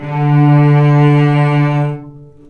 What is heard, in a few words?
VCS ATK D3 R, VCS ARCO, String Collection